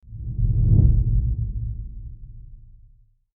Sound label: Swoosh